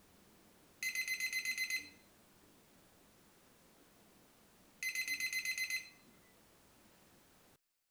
ME66

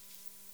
Barbastella barbastellus

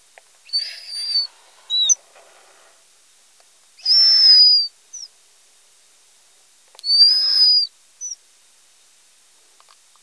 mouse juv

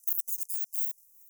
Rhinolophus euryale, batcalls, europe

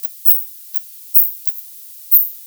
Nyctalus noctula, batcalls, europe

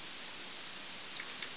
rat

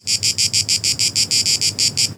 cicada orni

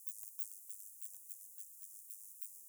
Myotis myotis

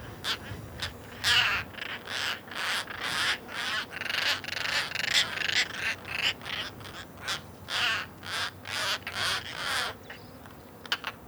roller

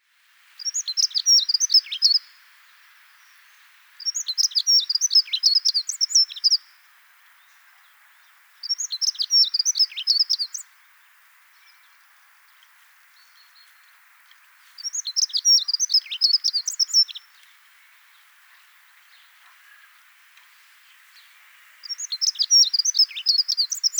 Prunella modularis

